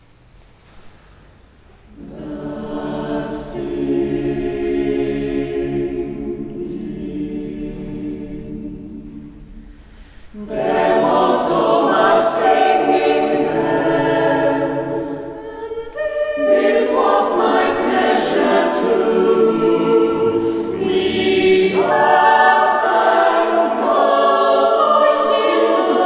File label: singing